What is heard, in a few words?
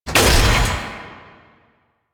Turret UI Menu Open; Warframe; Zariman